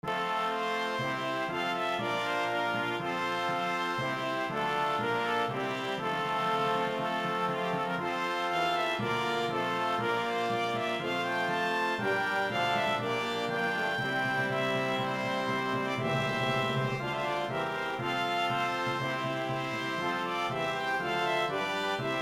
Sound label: Hymn BRASS